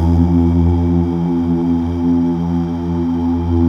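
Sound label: Male Uuh; Best Service Gigapack 1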